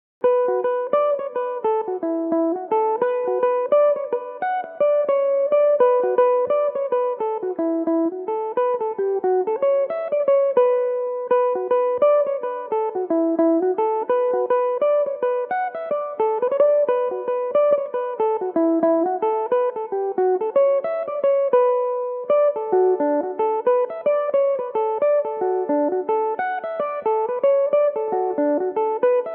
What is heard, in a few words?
jig b minor, mandolin; The Dilettante; Belmuse EM-190 electric mandolin